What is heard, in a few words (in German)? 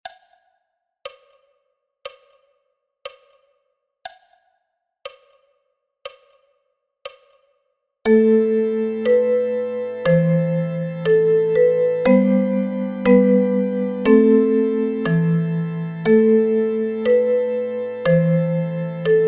Ukulele & Bariton Ukulele; Bottle of Rum; 12 Seemannslieder